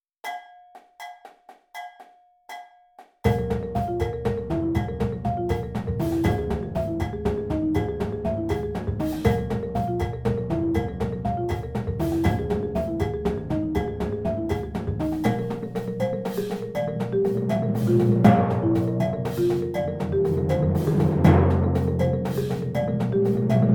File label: bembe, percussion